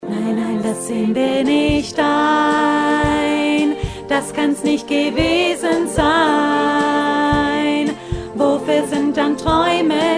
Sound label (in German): Lebens Ziel Mittelstimme